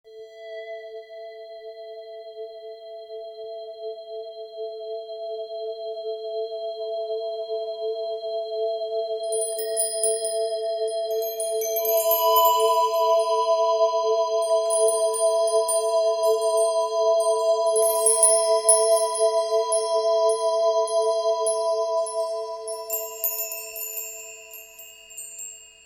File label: Artic Tundra Winds Cold Tundra Winds Blow